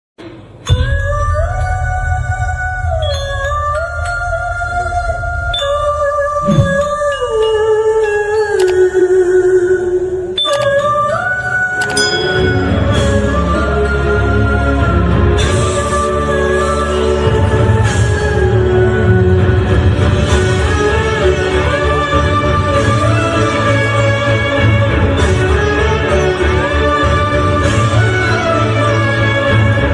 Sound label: emotional bgm ringtone; annaatthe movie; tamil